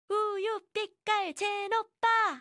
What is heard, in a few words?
cheering